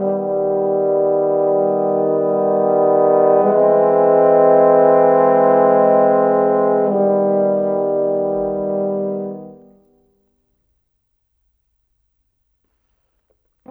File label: Horns, Cinematic